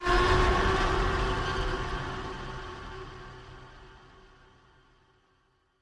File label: Eerie Hit; SoundsCrate